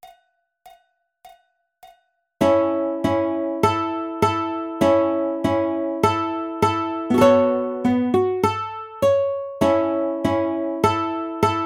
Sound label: A Major Arpeggiator Ukulele